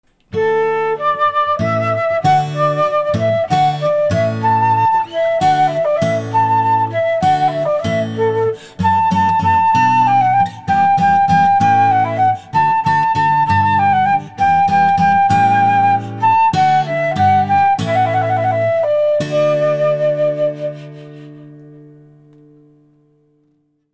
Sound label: allegro